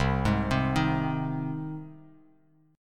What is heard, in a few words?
C Minor Sharp 5th, chord; arpeggiated; JGuitar